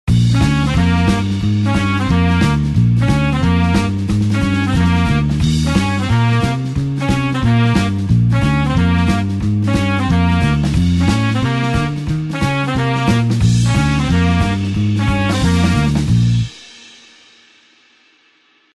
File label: blues horns up Bb